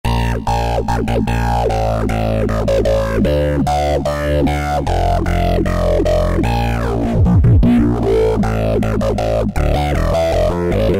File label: Distorted Bass, Korg microKORG; Factory Voices